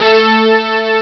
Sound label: brass, Amiga Soundtracker Sample Packs